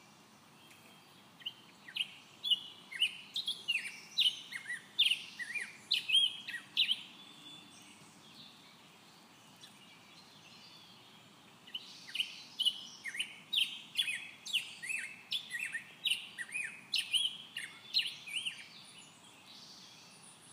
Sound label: black headed grosbeak